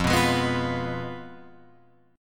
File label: F Diminished, Guitar, Open F; strummed; 5,6,8,6,8,6; JGuitar